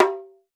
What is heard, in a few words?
Nakrazhan, Percussion; Roland BK-5